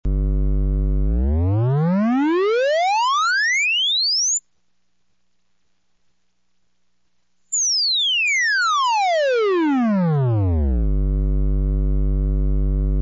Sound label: WAVE sweep; PSIM-1